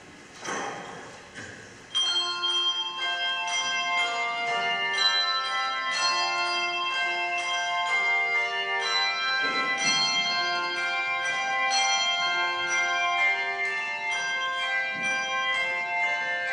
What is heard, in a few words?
Handbells